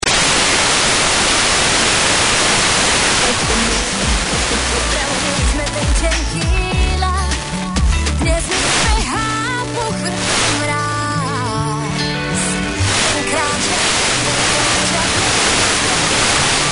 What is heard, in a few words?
slovakia